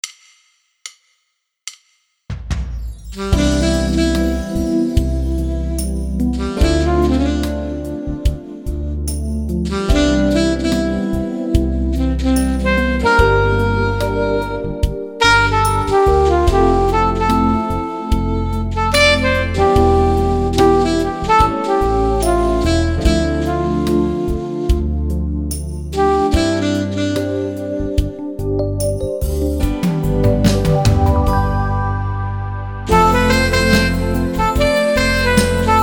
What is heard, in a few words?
Alt Sax Sweet Beat; Wersi